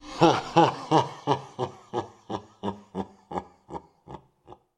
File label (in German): Lachen